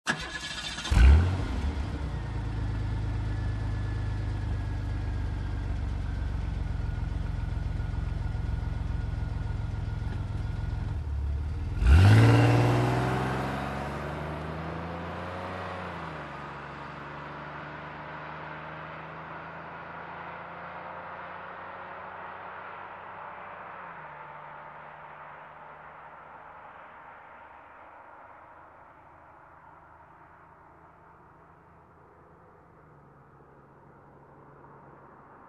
Muscle Car engine ignition and drive away

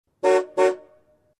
Car Honking Twice

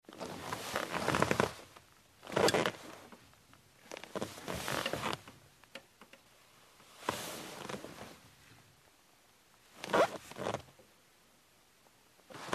Leather chair creak

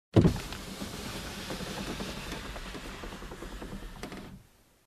Car window opening

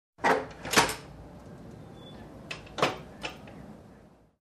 Open and Closing office door

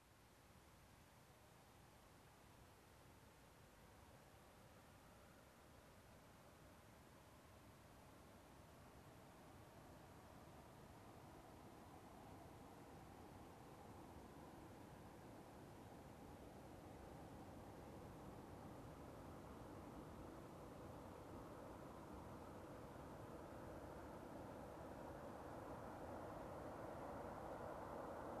Car approaching and parking

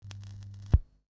Vinyl Needle being lifted

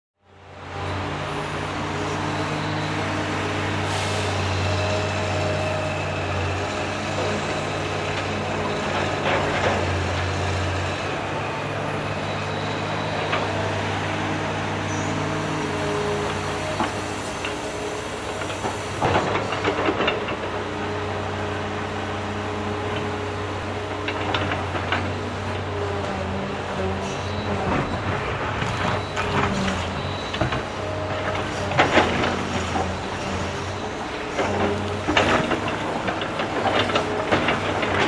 Construction

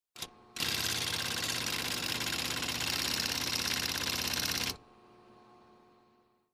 Mechanical Drill

